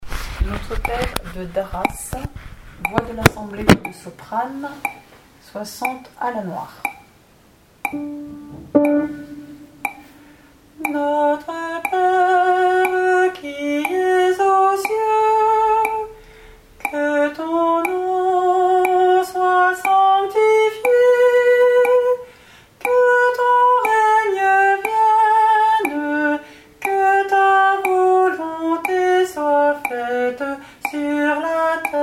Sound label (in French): NOTRE PERE ASSEMBLEE; DARASSE